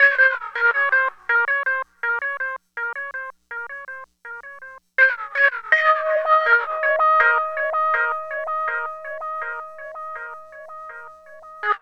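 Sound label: synth, Drum & Bass; Best Service ProSamples vol.03 - Drum & Bass 1; AKAI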